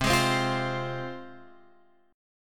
C Suspended 4th Sharp 5th C, Guitar Open F; Csus4#5 strummed; x,7,8,7,8,7

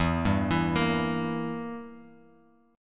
E Diminished E down Guitar Open F; Edim arpeggiated; JGuitar

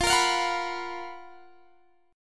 Gb Major 11th

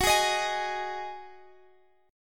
D sharp Minor Double Flat 5th

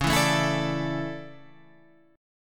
A Suspended 4th, C sharp

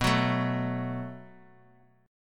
Gb Minor Double Flat 5th

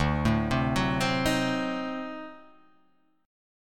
D 7th Flat 9th D down, chord

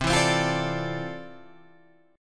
F Major 13th C, guitar chord

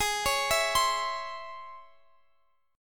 C sharp Minor Major 7th, chord

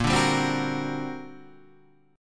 G Minor Major 11th, Bb

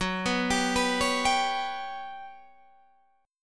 G Major 7th Flat 5th Gb

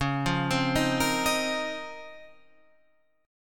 Db Major 13th, Guitar Half Step Down

